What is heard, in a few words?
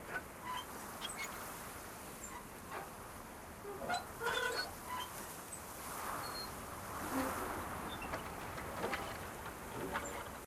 loop squeaks In The Wind; World Of Goo